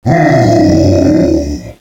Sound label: ohhhhh; DS; ML BOWSERS INSIDE